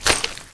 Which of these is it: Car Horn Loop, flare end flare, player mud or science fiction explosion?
player mud